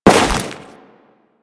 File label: Crewman Step Ice, Footsteps; Warframe; Corpus